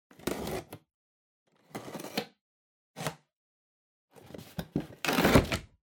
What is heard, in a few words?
Cardboard Box Unbox; ダンボール01-01(開封); 効果音＞梱包材